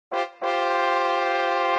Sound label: Bus; Pocket Academy 3